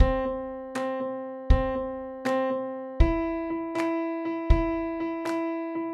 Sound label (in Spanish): trecillo